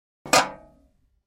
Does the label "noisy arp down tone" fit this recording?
No